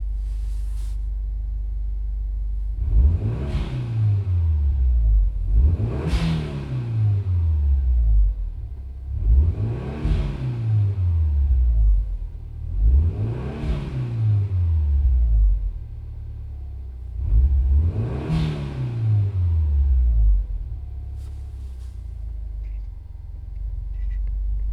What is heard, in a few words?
Subaru Legacy GT GFB BOV Interior Stationary; Leinninger; 2011